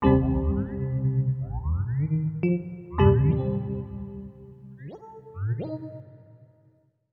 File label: SYNTH D+B 160 C, Drum+Bass, Keys; eJay